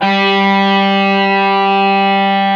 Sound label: GTR SOLO G3, ROCK GUITAR; Greytsounds VOL-1; ROCK GUITAR1